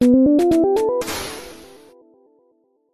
8 bit small win arcade 80s simple alert notification game